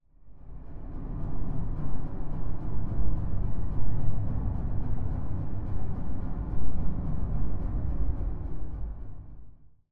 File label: UI BACKGROUND LOW Dark Drone; Doex Studio; Qantum UI